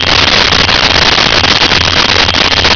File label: asteroid beam loop